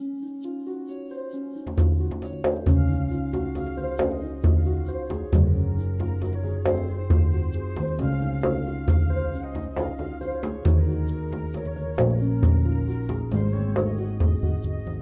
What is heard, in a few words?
karaoke